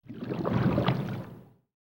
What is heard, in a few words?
Siren Abyss